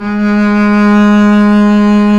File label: STR, Cb Bowed, f vb; Roland L-CD702; Cb2 f vb